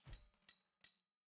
Build Tower; 3DTD

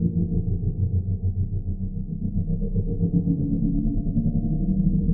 Black Hole; 3DTD

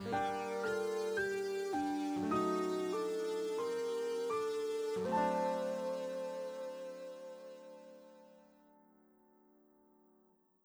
Game Over Jingle

